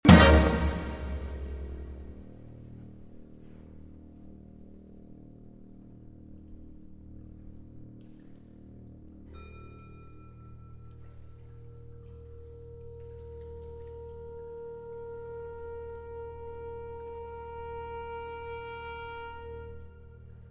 Double Concerto For Alto Saxophone, Percussion and Orchestra